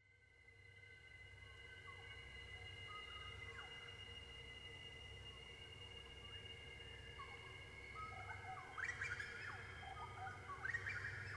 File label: Coal at Vic Tunnel, diesels